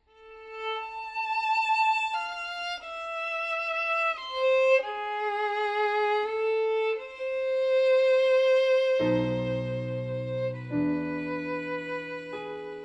Improvviso per violino e pianoforte

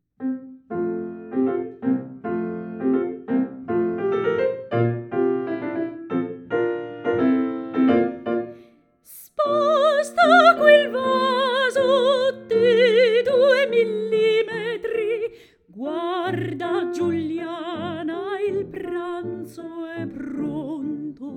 Voce Pianoforte, canzoni satiriche

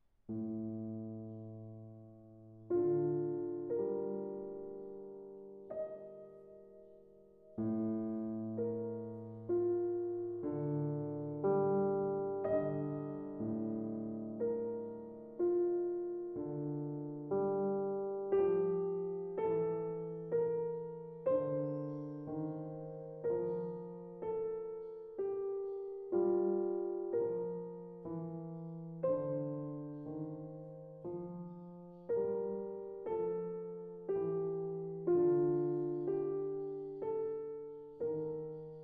Cinque piccoli pezzi per principianti 1 Adagio Pianoforte

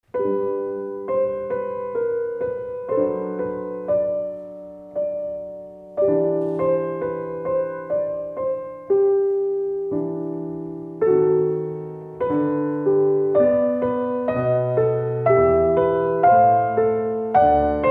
Sonatina n.1 II Tempo Pianoforte

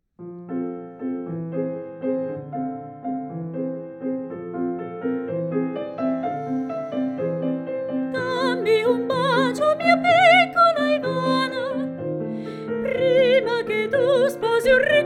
Voce, Piano; Pianoforte

